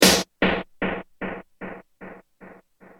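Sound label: snare ad202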